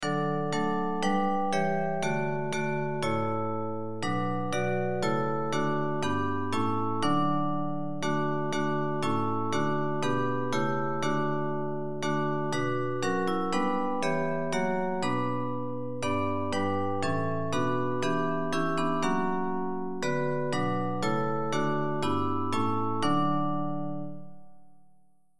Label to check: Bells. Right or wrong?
Right